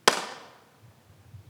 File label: IR clappatore; Teatro Benevento